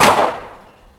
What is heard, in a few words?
revolver